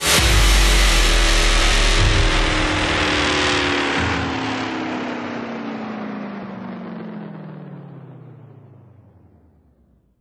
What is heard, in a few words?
Impacts